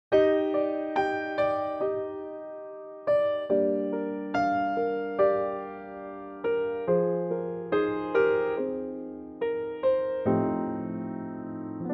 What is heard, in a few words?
Eb piano; key - Eb - vocal range - B to F; big ballad; Wonderful big ballad